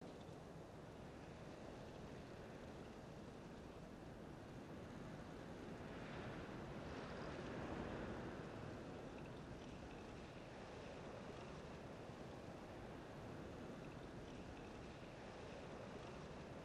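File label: Truck Chase ambience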